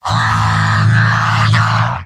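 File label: pain critical death, male; heavy; tf2; garrysmod; mvm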